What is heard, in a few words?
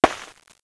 Snowball Hit; olpc games; SnowFight